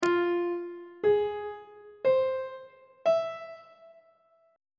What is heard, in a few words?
e sharp melodic minor 7th chord 1 is e sharp minor major 7th on treble clef